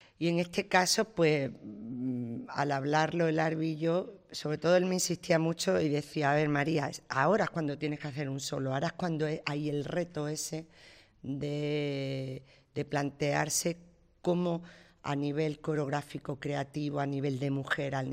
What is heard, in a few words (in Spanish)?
flamenco